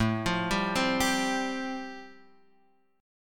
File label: A Minor 7th Sharp 5th A, Guitar, Half Step Down